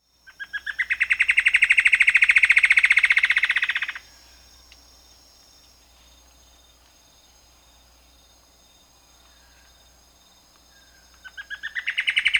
Plumbeous Antbird (Myrmeciza hyperythra), Peru, Birds of the Amazon; S. America